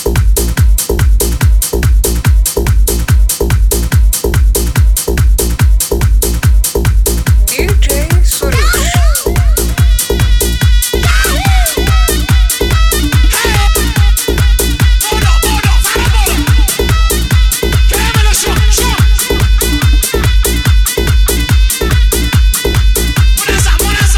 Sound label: Saz V Dohol